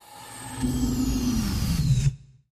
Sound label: SPACE LIFT, elevators